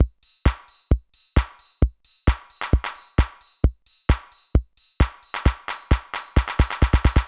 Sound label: Beat, Old Skool House, 132bpm